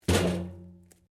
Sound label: Pipe Tin Move; Metal; Sound Effects:Materials; Foundation3